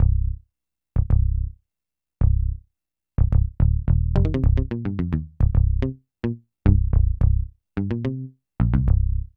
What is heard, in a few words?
BASS LP